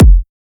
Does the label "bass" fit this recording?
No